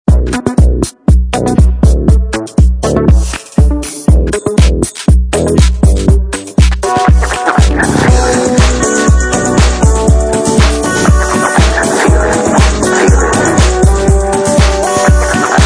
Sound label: Melody, RingTune